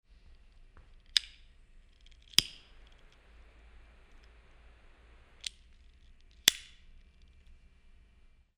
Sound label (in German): Feuerzeug, Binaural